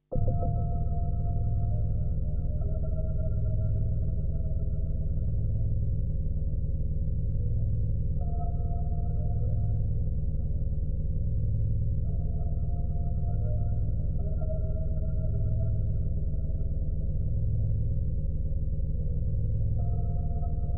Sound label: Glockenspiel